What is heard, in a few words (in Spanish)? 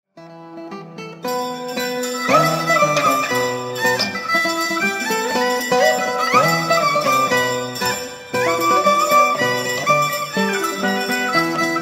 DANZA DE LAS TIJERAS